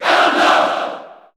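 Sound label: Cheer Japanese, crowd; Ganondorf; SSB4; SSBU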